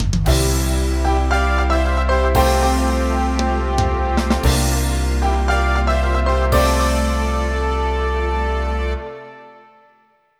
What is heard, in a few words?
Jingle Game Start; wii fit u; Driving Range